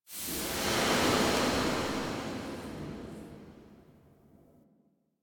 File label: Lotus Hand Projectile Smoke; Warframe; Weapons; Duviri